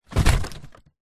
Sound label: foley cardboard box parcel wrapped paper drop thud